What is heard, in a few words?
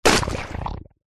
cow pat poop land ground